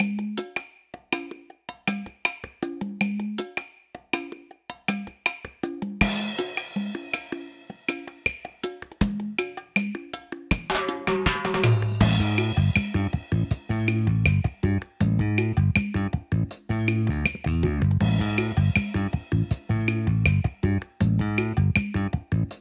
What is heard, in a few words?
Salsa (No Cheering); wii fit u